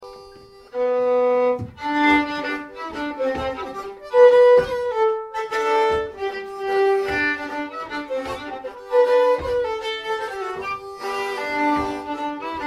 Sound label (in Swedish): Polska; Polska e Ros-Jorn